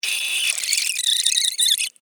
Alien Insect Vocal, Scifi, Alien, Insect, Vocalization, Creature, Small, Science, Fiction; Blast Wave